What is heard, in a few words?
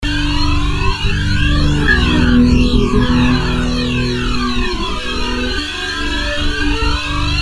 slice loop